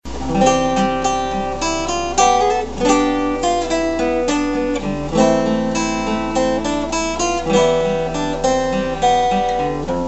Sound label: Acoustic; Dbzgt